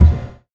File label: ambience ind stop